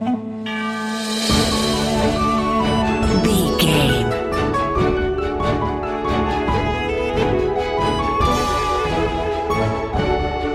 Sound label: cartoon pitch intro; 3 friends